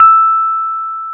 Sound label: E 5, Electric Pianos; Wizoo Electric Pianos